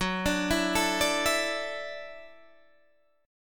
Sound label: Gb Minor 7th Sharp 5th, Guitar DADGAD